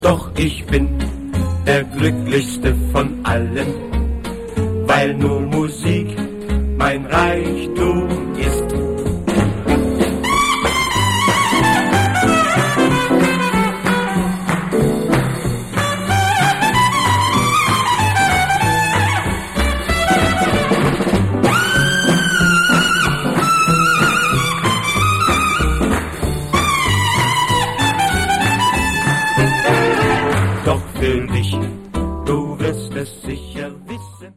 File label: Jazz